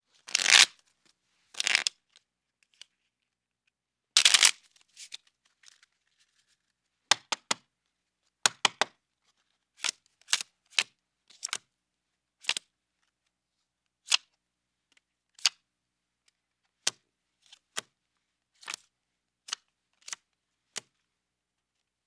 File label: card shuffle multip; Asian Show Sound Effects